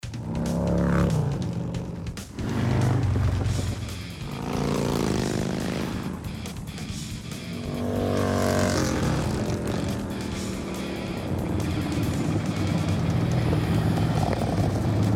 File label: Motorcycle Rally; TPMG